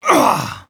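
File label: voice pull loose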